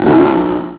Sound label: Growl; Doodle Patrick